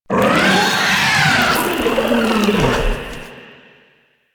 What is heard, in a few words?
Raknoid Death; Venus Kahl; Warframe; Quests; Veilbreaker